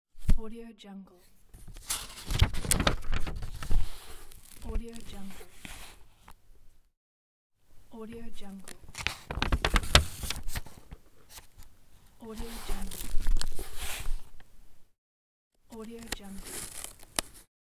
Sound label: old book page flips